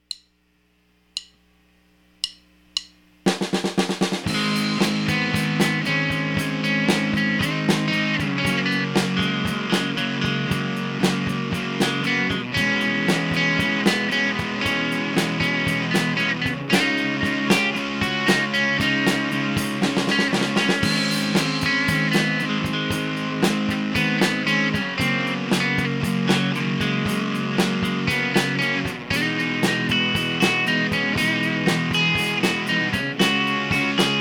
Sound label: drum Beat; An idea that started as a drum beat